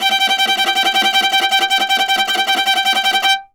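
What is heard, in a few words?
viola tremolo G4; Orchestral; DirectWave; Varazdin Orchestral; Viola II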